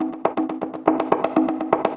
Acoustic Percussion

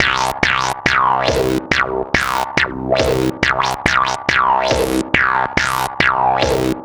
Electronica Drumloop; Drums

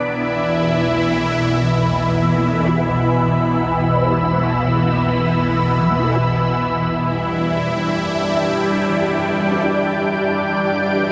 Pad, Synthesizers

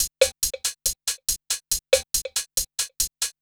Hi-Hat Loop, Drums, Dance Beats

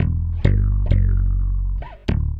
Chapman Stick, Basses, Loops

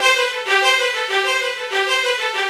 Strings

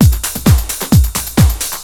loop; SoundFactory; SMPMANIA

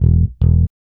Electric Bass; Basses

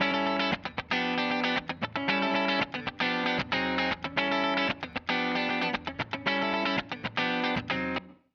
Electric Guitar, Loops; Guitars

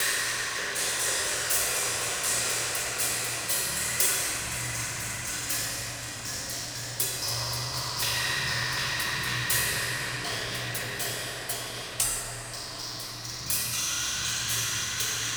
Electronic Percussion

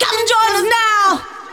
vocals; SoundFactory; SMPMANIA

